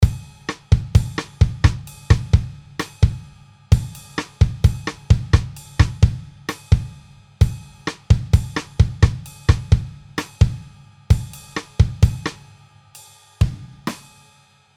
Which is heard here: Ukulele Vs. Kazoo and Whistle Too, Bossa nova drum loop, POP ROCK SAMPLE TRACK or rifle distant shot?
Bossa nova drum loop